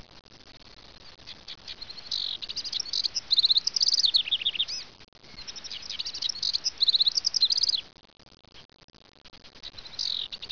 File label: Squeaky, eastern washington birds